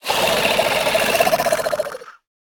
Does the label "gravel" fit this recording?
No